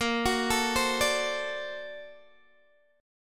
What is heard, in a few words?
A sharp Augmented Major 9th, Guitar DADGAD